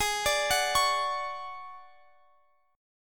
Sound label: D Major 7th Flat 5th, A flat